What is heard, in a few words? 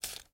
Tape Take; Game rip; MiSide